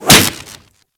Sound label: Whip Impact Default, Weapons